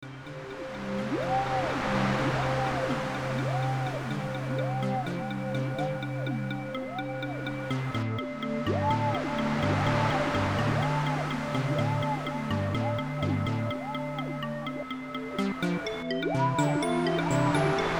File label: slow ocean fly